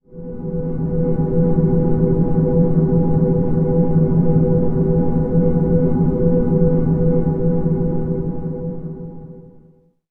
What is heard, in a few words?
temple drone E, ancient drones; Zero-G Seismic Frequencies; 90 s Sample CDs